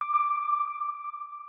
organ

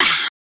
vox

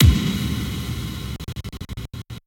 Dr impact

